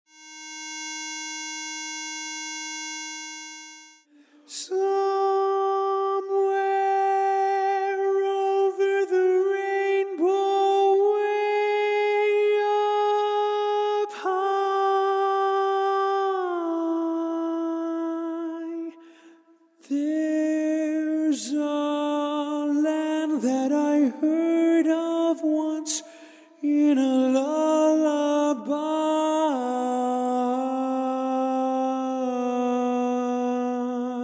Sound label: tenor